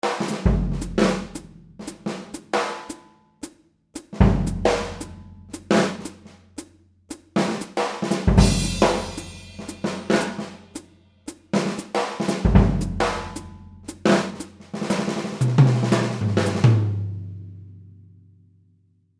Jazz Slingerland; BFD Factory

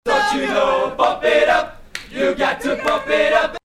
Hercules DJ Sample

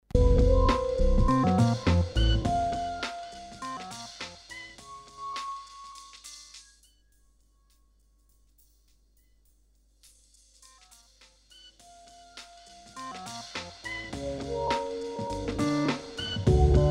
Numark v7 hpf

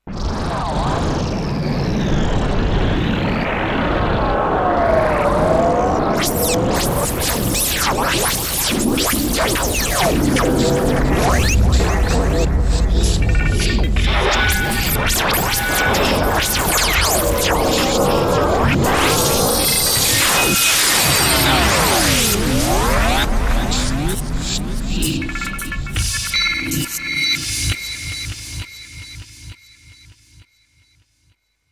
Planetary Explosion; Combi

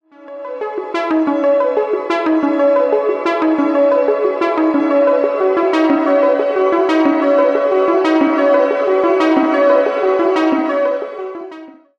plate shimmer

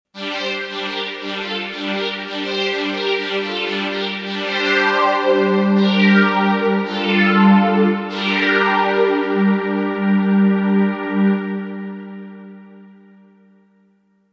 G2 Pad warm

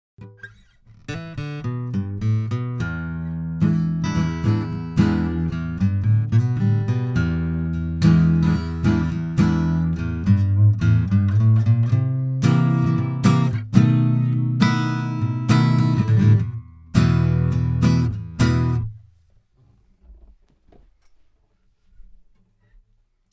Soyuz the Launcher rb100 Ac guit dry; rguit

